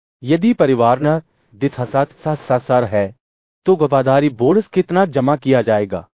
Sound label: techno